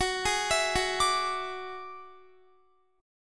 Gb 7th Suspended 2nd Sharp 5th Gb down Guitar; arpeggiated

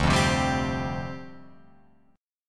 D Minor 11th D down Guitar Open F; Dm11 strummed

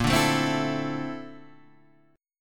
A sharp Major Sharp 11th, Guitar, Half Step Down; A#M#11

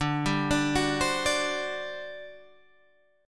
G Major 7th Suspended 4th, D

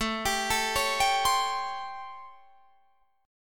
A 7th Sharp 9th, Guitar, Open D

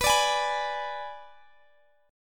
Ab Diminished B

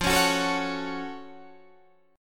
Gb Minor Major 7th F

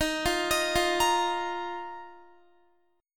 Eb Suspended 2nd, Guitar; Ebsus2; arpeggiated

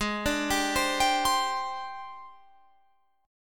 G sharp Major 7th Flat 5th Guitar Drop D; 18,17,17,17,20,20

